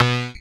SYN TWO, Dance Synth; Sound & Vision - Gigapack I CD 2 (Roland)

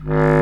WND CB Clarinet; Roland LCDP04 Orchestral Winds; Lo Clarinets

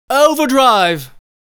Overdrive, Vocal Phrases; Ultimate Vocals

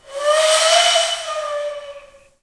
AF FLUTES, Heart of Africa

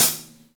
V-Pop Closed Hi Hat, Roland BK-5

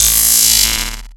fx Noi2, Akai MPC-5000

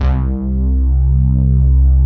BRIGHT POLY; Pro-Rec - Synthsonic; TRINITY

